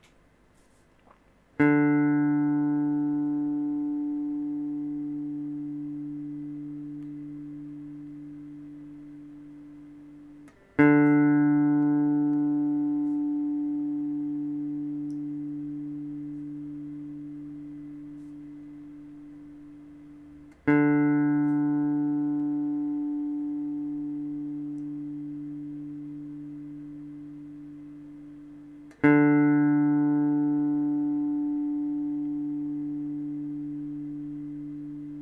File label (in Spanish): Sonido de guitarra eléctrica cuerda 4 Re D; afinar una guitarra